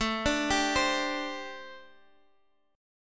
C Suspended 2nd, A, guitar chord; C2/A arpeggiated

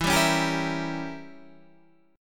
E Diminished 7th Guitar Standard; Edim7 strummed; 12,10,11,12,11,12; JGuitar

